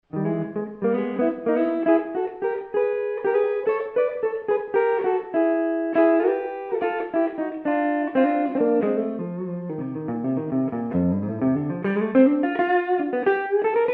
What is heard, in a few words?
neck, guitars